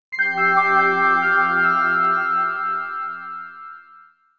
Phone Poweroff; Samsung SGH-i320; Power Sounds

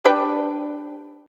Ring Breve; Vertu Constellation Quest

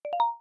start tone; Samsung Galaxy S8; VoiceWakeUp

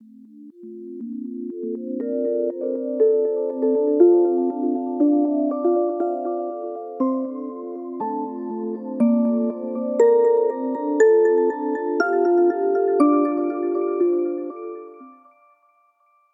alarms abstract; Amazon Fire-Phone

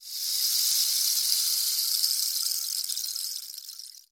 Rainstick, Natural Elements, notifications; Google; Google Sounds

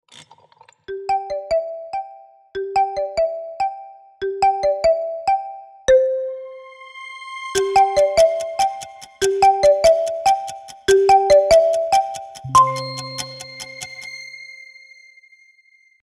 Get Up Action, alarms

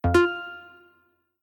Alert; Newman; CM810

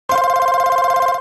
Ring Standard; Samsung X01SC Omnia PRO

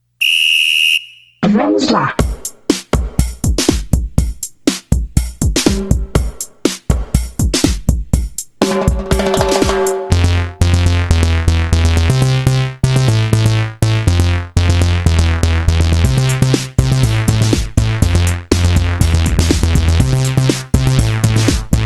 ringer elektro; vamos la; ZTE; Open-C

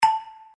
dial tone marimbas; OnePlus One China

